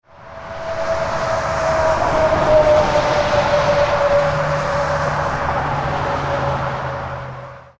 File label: truck passing